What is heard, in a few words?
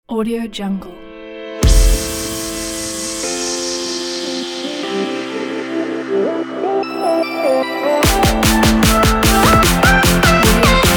melodic dubstep future bass